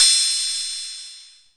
Korg KPR-77

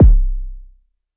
mbrute kk, Arturia MiniBrute; DRUM MACHINES

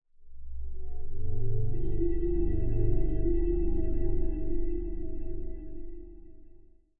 G1, Dark Nostromos Pad; multisampled; pads and strings

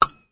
vintage cartoon noggin; Cartoon Sound Effects Kit

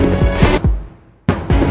140 string timpani break; STRINGS ORCHESTRAL CLASSICAL LOOPS

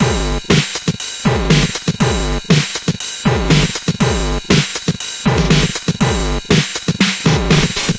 120 industrial breakbeat, DRUM LOOPS and BREAKS

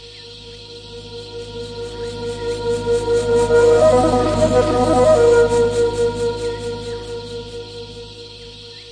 passion flute back n forth

